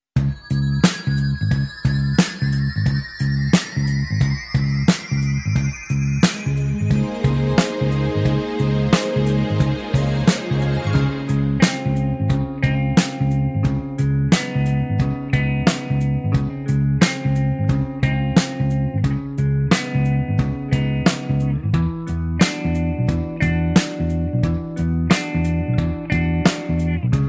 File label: Db No Backing Vocals, backing tracks